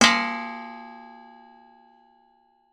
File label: industrial gas cannister large hit ping